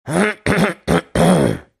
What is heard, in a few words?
throat clear